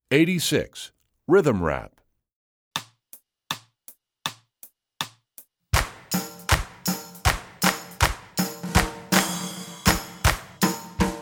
Rhythm Rap, Strings; EE2000 Book2

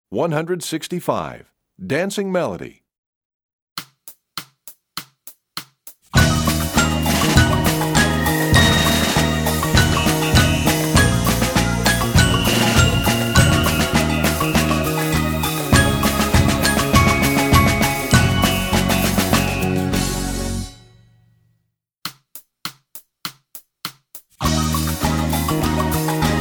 Percussion; Dancing Melody; EE2000 Book1

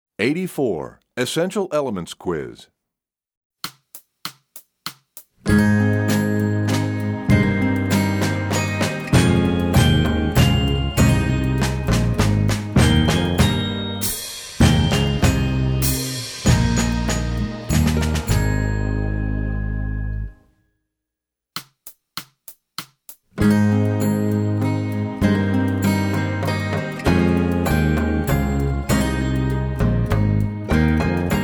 Percussion; EE Quiz; EE2000 Book1

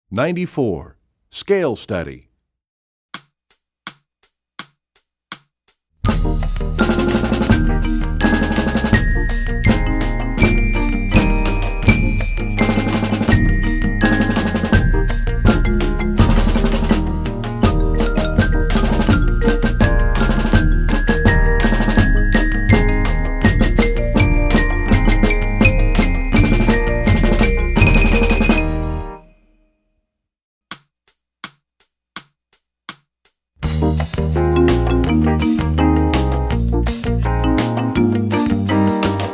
Scale Study, Percussion; EE2000 Book2

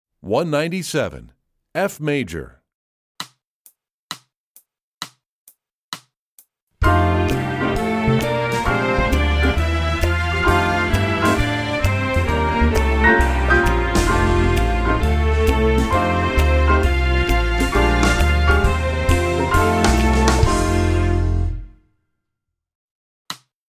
F Major, Strings; EE2000 Book2

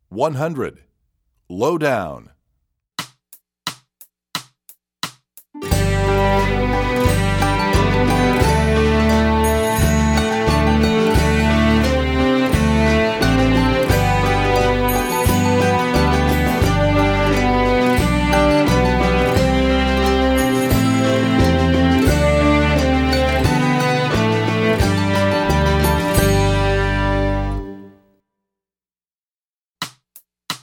Low Down, strings; EE2000 Book1

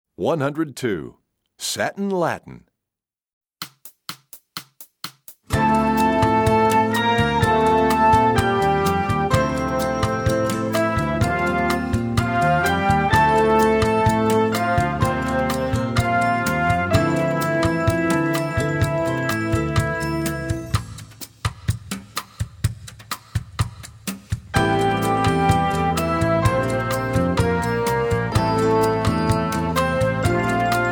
Satin Latin; EE2000 Book1

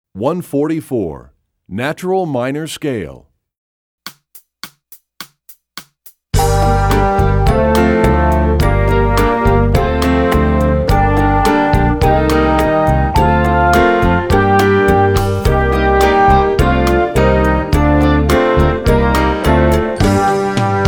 Natural Minor Scale, Brass WW; EE2000 Book2

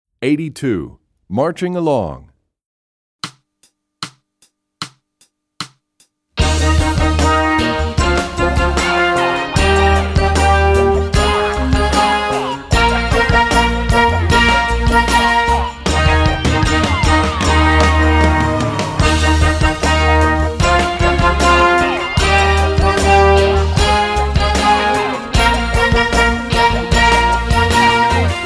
Marching Along; EE2000 Book2

